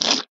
squirt

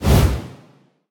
whoosh large; Nut Boarding

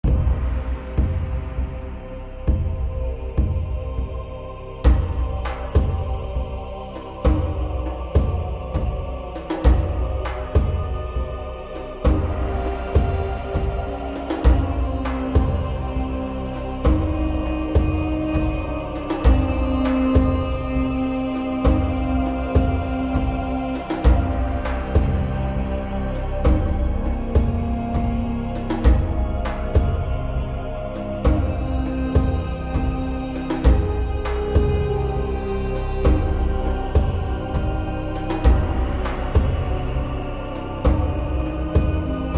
desert music loop; painkiller overdose

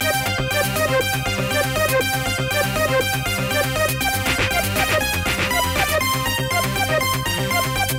Aerobics; Dreamer Series Top Model; 2009 nds gamerip

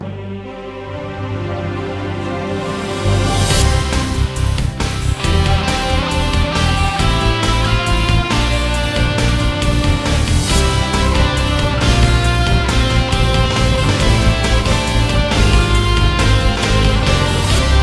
BGM ending

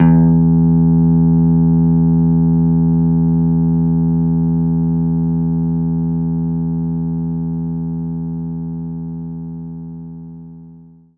Jazz Guitar E2; knights try

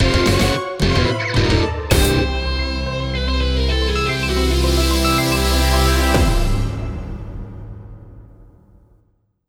sting gameover; Gunpig: Firepower for Hire

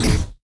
Interface

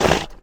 wolf noise

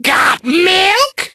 Bone Flingers Calcified Bones; Fallen Ones

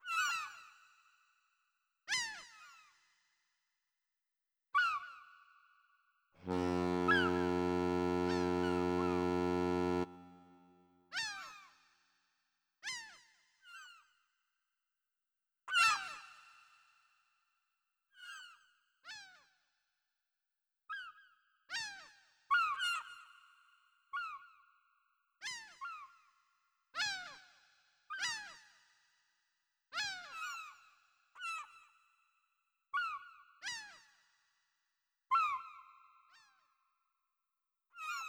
Ambience Fishing No Wave; AR Games

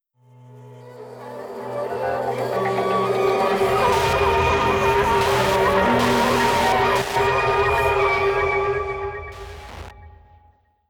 Noise Music; Super Danganronpa 2; Kibou Dansen

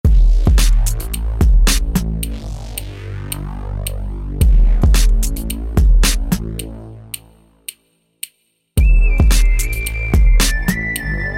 Hip Hop

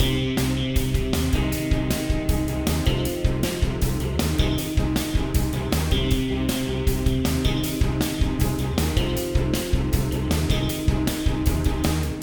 City Platforming MIXED; Ben 10

